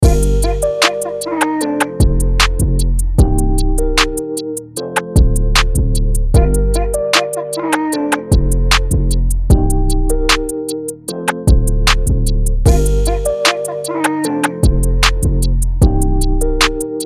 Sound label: 152 BPM Gm; UNISON DEMO; PlusPM